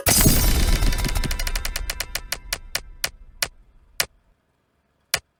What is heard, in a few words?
spin; blox fruit